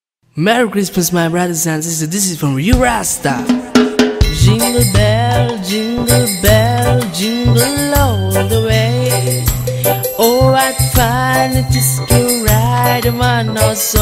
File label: jingle bells reggae